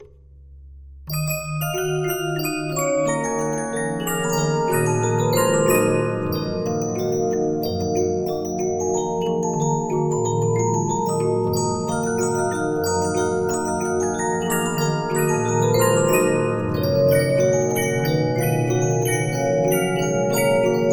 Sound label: Regina, 15inch disc musicbox